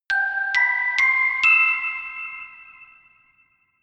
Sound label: notification glockenspiel creepy sequence ascend